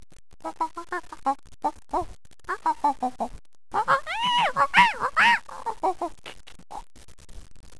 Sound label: monkeys